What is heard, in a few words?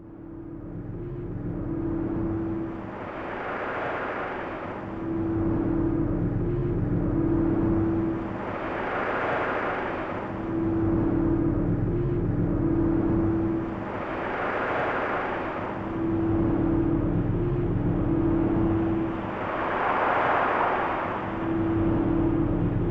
Death Wave E2; Zero-G - Ghost In The Machine